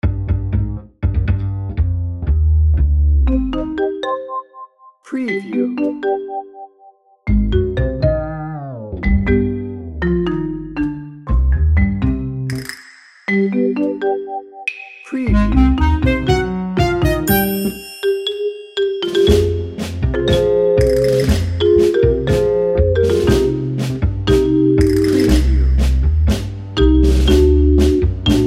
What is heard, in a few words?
tango mischief